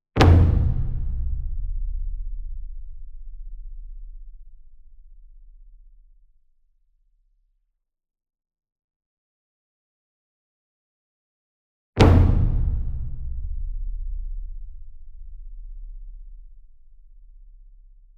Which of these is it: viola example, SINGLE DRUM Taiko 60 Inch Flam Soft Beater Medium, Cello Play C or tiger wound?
SINGLE DRUM Taiko 60 Inch Flam Soft Beater Medium